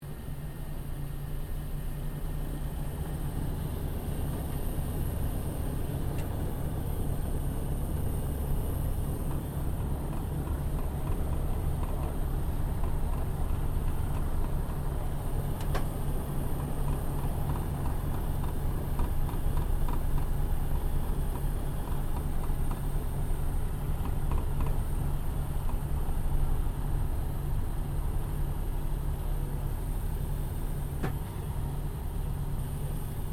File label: AIRCRAFT LOCKHEED HUDSON TAXI INTERIOR, Hudson twin engine light bomber; 1941; Airplanes; Vehicles